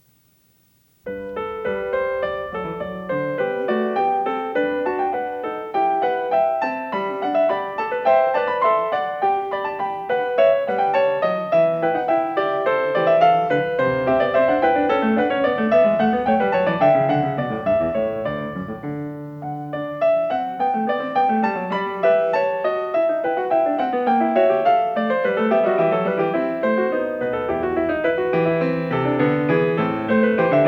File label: Variation 9 a 1 Clav. Canone alla Terza; Bach; The Goldberg Variations